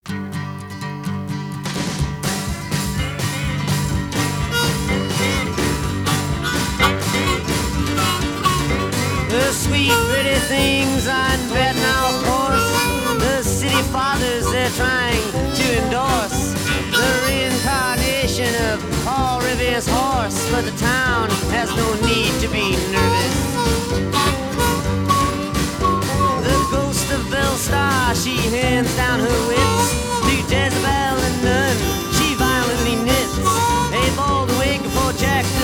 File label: harmonica overdub